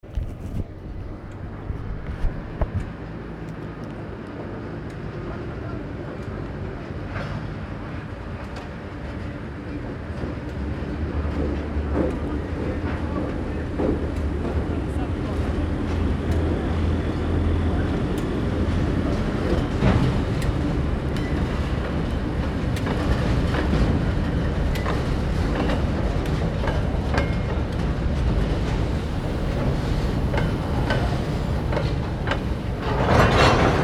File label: train port long approach; Port Chalmers